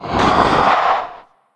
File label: hit; sinfar haks; sf effects